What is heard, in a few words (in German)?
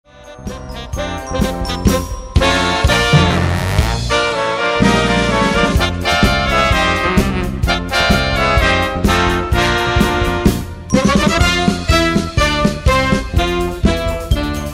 Polka